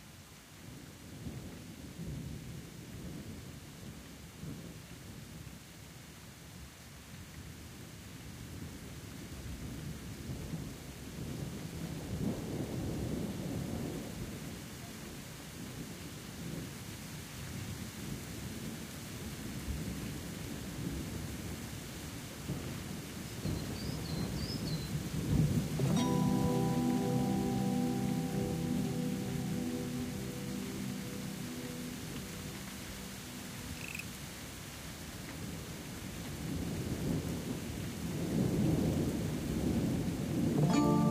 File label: Soundscape II Secret Forest